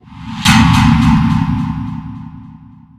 critical event, ui; Left 4 Dead; Source Engine

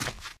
dirt, footsteps, walk

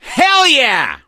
positive noise, gambler, survivor voice

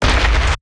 giant soldier step; video games; source engine; tf; mvm

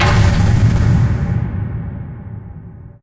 slow crusher open end; Source Engine; Portal 2

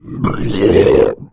boomer death

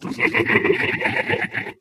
ballbot evil laugh

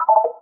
beep clear; Source Engine; Portal 2

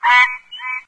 small frog, random animals, frog groups; ambient; Left 4 Dead 2; Source Engine

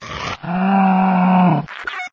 zombine pain; npc; source engine; episodic

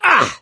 hurt minor, gambler, survivor voice; source engine; left 4 dead 2

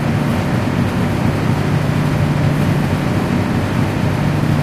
ac vent, ambient; Source Engine; tf; lair

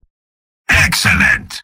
spy positive vocalization; tf; mvm

